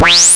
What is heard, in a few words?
SAW RING